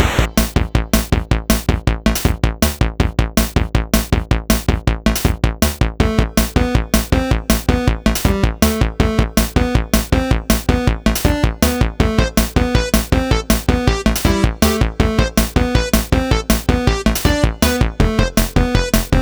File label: loop 80